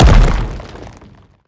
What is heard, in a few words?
bash hard, brickwall, destruction